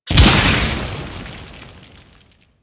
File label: Spike Slam Ability Burst; Enemies; Warframe; Infested; ZealoidPrelate